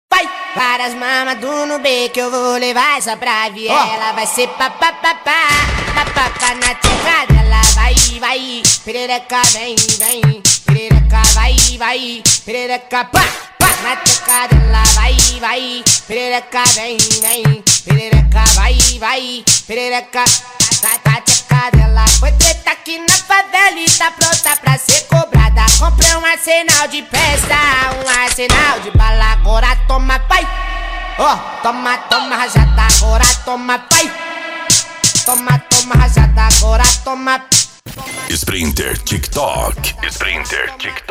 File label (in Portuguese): esp funk antigo